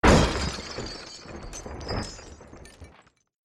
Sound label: smash; Slugocalypse